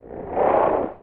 science fiction alien creature growl

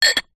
household small ceramic sugar pot lid on; zapsplat

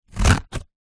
office scissors cut card

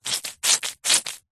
household wet cloth squeezes

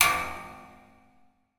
impact metal strike spring large industrial

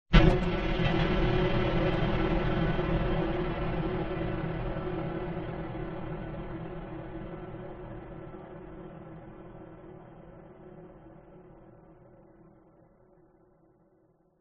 sound design cinematic hit phased suspense

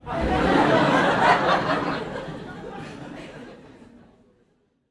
human audience laughter comedy club x200 people

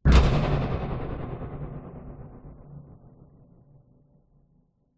sound design impact metallic slam droning tail; zapsplat

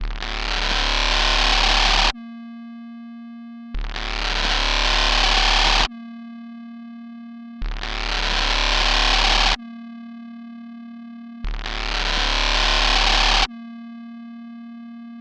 Hard Worker Loop F; martyn stonehouse

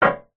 household glass window large knock x1

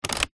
leisure toy slider toggle plastic slide

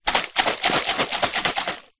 drink water in plastic flask bottle shake

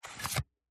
Foley Debit Credit Card Reader Swipe Pull Out; PMSFX

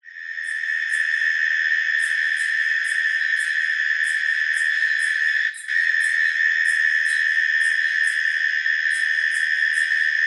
insects night australia autumn; animals

